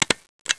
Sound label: STAPLR